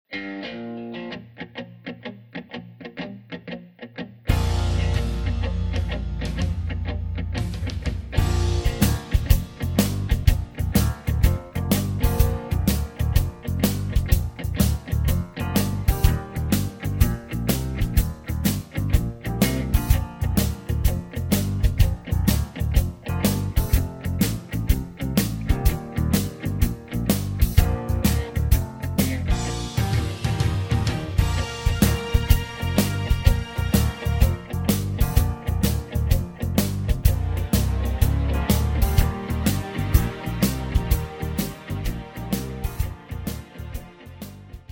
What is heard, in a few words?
Bb No Backing Vocals, backing tracks